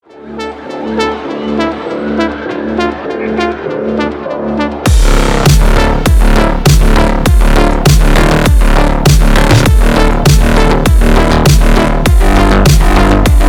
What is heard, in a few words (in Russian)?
ehlektronnaja gromkaja muzyka